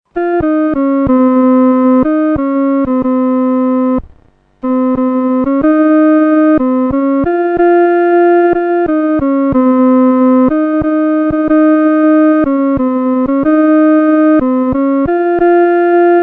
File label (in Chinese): 独奏 第二声